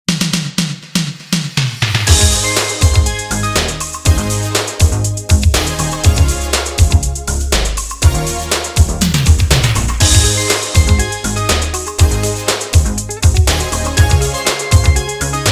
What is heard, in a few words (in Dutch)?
instrumentaal; Duo Penotti